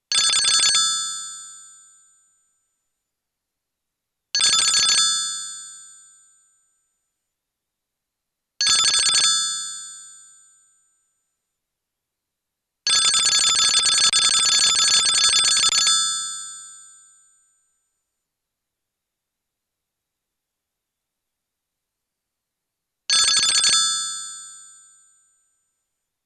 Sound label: Old Venezuelan Phone, ringtones; old phones